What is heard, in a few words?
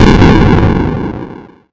explosion; project hood